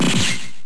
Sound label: Airshot; MMBNMMOP